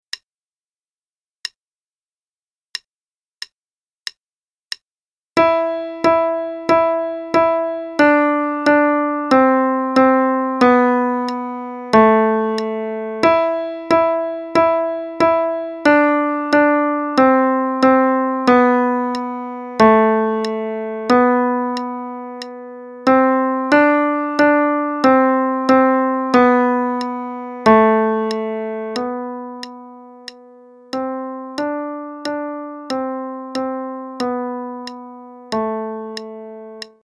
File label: Russian Folk Dance, qn=92; Primer, Book B